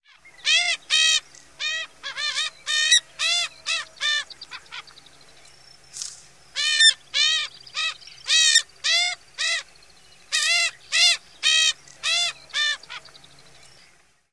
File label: broken wing display